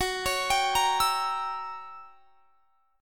F sharp 7th Flat 9th, down; F#7b9 arpeggiated

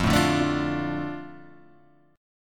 D Minor F, chord

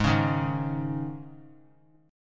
G sharp Suspended 4th G sharp; G#sus4 strummed; 20,20,18,18; JGuitar

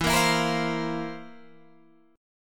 C 11th E

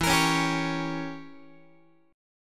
E Diminished 7th, Guitar; Edim7 strummed; x,12,13,11,11,12; JGuitar

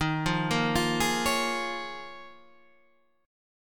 Bb Minor 7th Eb; Bbm7/Eb arpeggiated

